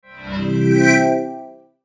Whoosh Swirls Shimmer; Blastwave

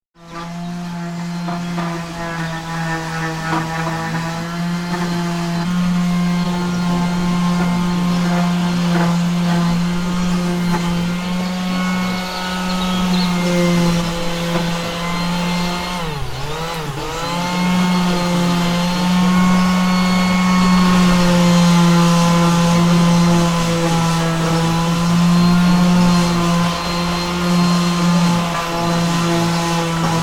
Garden Strimmer, Electric grass strimmer; Power Tools (Sound Effects:Tools)

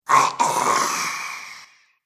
Zombie - Mr Spleen; Horror Sound Effects; Zomby Sound Effects

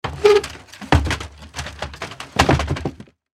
Cot Flip Roll Away, Cot Flip Roll Away Bed; Household; foley; Bedroom Sound Effects; Blastwave

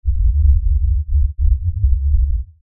LFE Tremor, Lfe Tremor Low Bass Shake Rumble; Rumbles; Blastwave; Sound Effects:Sound Design

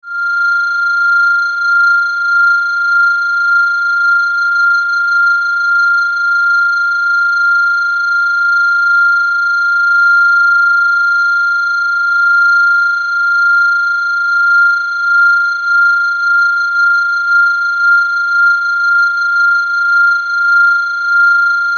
Science Fiction Laser Scope High Pitch Tone; SFX Bible 6

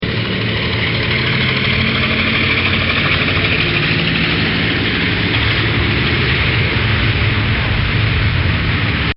Sh-3 Seaking Helicopter Fly-By; Helicopters

